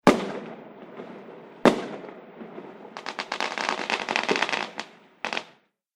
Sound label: Fireworks Bang Bang And Sparkle